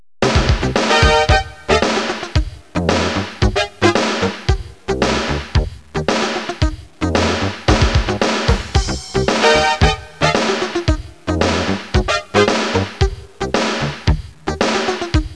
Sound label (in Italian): suonerie businessy